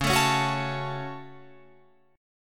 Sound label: C sharp Suspended 4th Sharp 5th C sharp down Guitar Open F; strummed